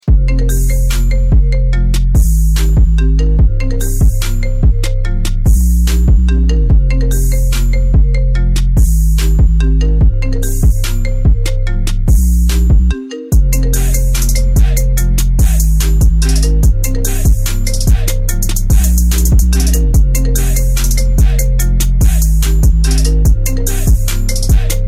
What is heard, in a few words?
trap remix